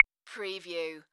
Multimedia Percussion

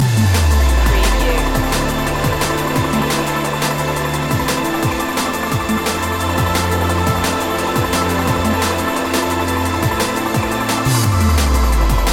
drum n space loop

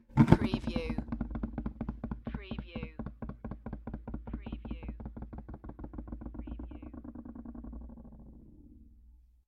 Object Rocking; beanstalk audio

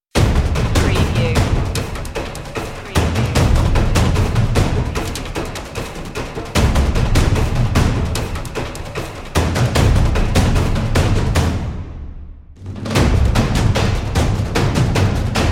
drums of destruction

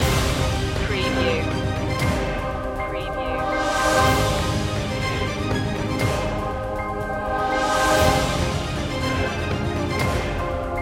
countdown

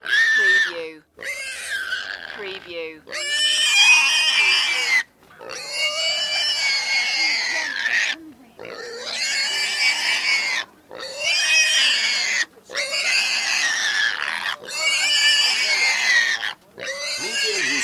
screaming pigs

